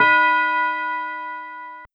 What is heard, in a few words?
Bell D#5